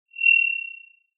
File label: Sound of an owl